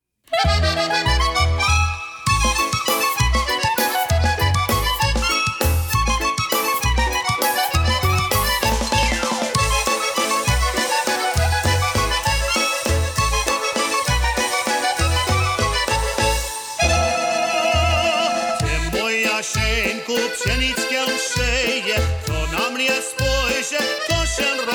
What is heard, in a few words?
Oberek